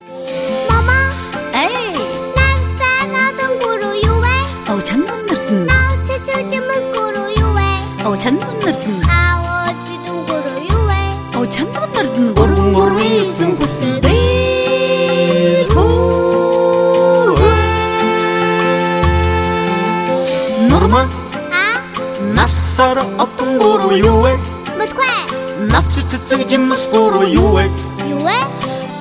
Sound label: Baby Song